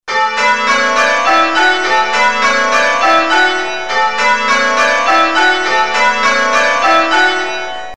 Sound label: Back Rounds, changeringing